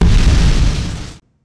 ham hit; RYL 2

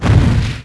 firing; RYL

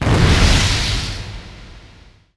blaze; RYL

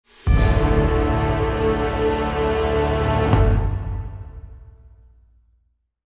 Music; Age of Empires III; cinematics

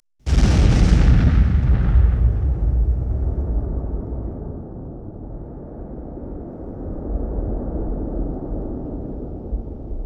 5.1 Explosion; Sony Pictures Sound Effects Series; Explosions - 5.1 Surround

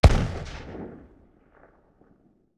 Musket Single Shot Distant; Period Combat; Sony Pictures Sound Effects Series

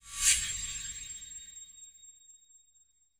Flash Frame Lite; Title Sequence Effects; Sony Pictures Sound Effects Series

